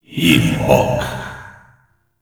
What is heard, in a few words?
Alt Fire Whispers; Warframe; Tenno; Grimoire